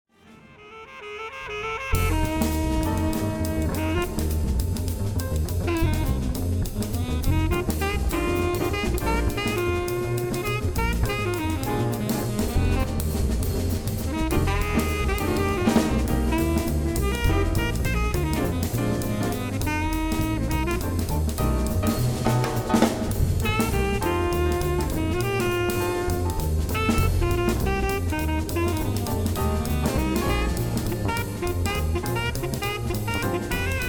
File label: Six Pack Blues